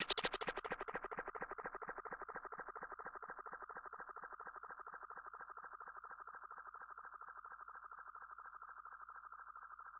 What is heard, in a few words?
FX, viral hiphop